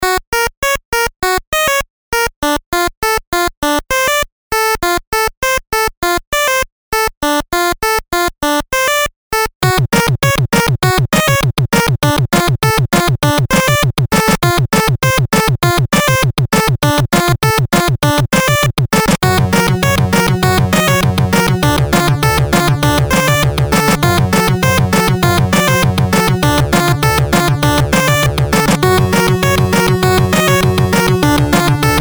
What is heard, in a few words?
pulsewave; weekly beats 2020